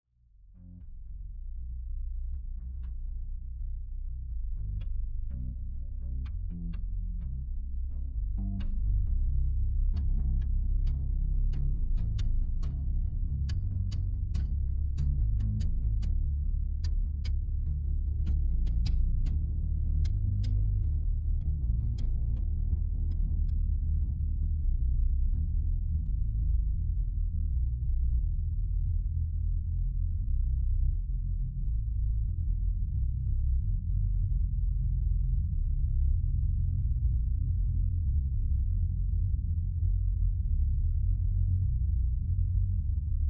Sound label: Bass noise; stems